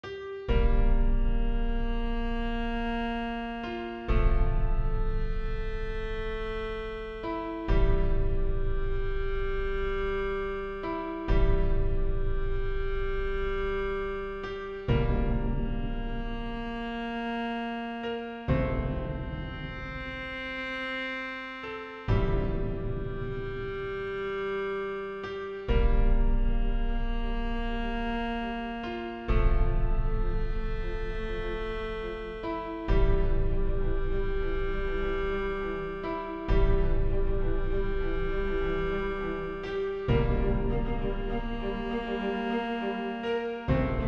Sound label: Epic and Dramatic Trailer; Ross Bugden